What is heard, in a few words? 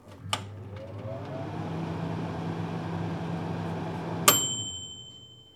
Microwave Oven